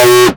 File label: Synths B; vengeance essential club sounds-3